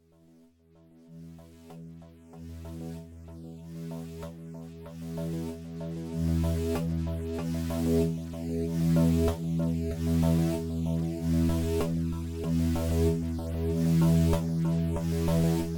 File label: trip hop